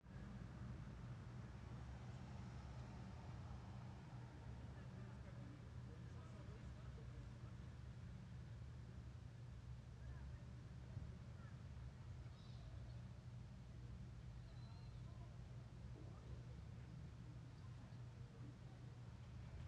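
Exterior City Ambience, Car Drive-Bys, Pedestrian, Air; Blackmagic Fairlight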